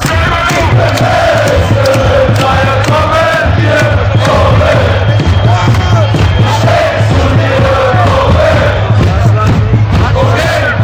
fan chants

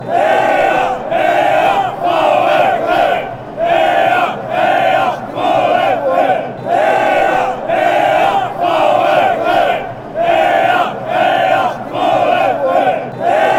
hey hey, fanchants

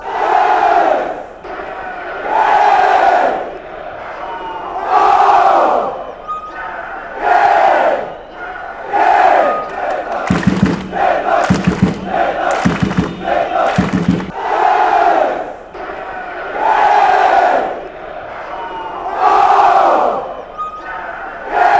shouts, fanchants

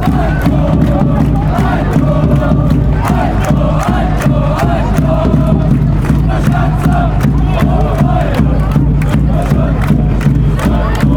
goal, fanchants; ingol

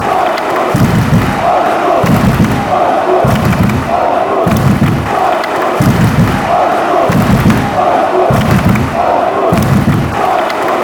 fan chants; wolfsburg

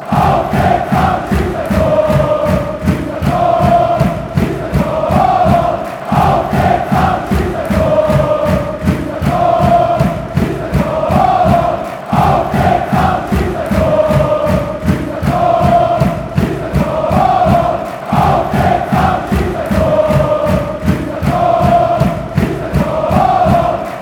score a goal fan chants

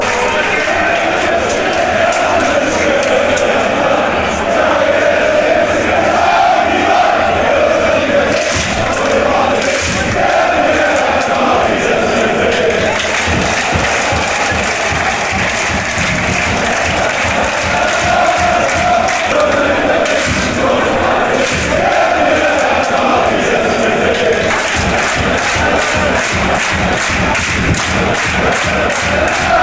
shalala clap, fanchants; hertha bsc

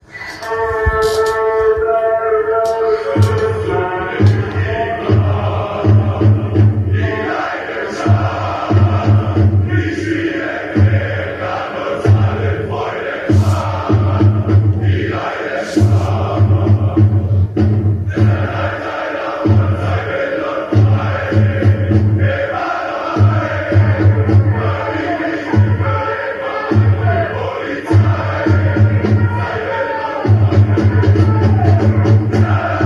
melodisch, fanchants

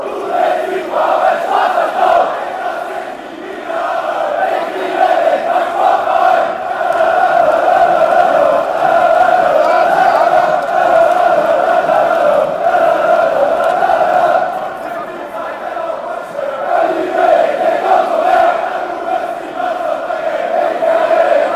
sport club fan chants

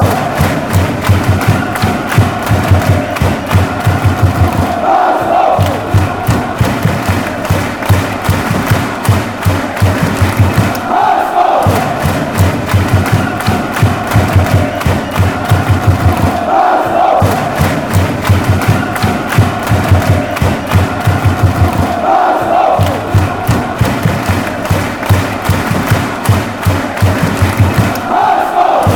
clap, fanchants; hsv

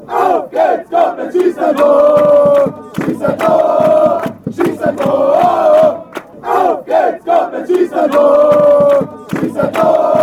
goal, fanchants

